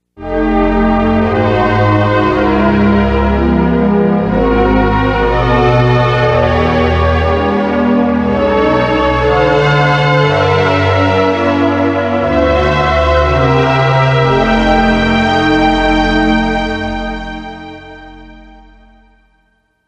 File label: Progression, chord progressions